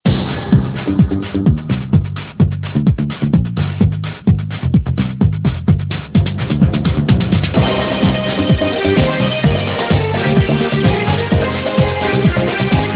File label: ringtone house